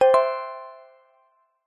multimedia game tone marimba simple basic positive; zapsplat